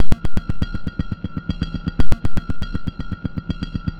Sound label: Beat, Modular Electronica